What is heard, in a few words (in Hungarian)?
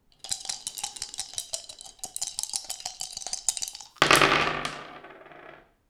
kockavetés, dobókocka; Perkupa